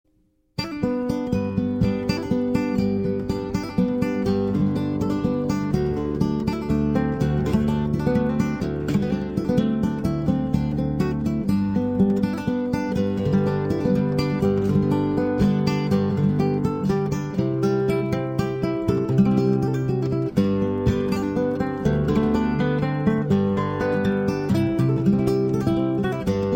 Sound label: Hawaiian Slack Key Guitar, Christmas Carol Waltz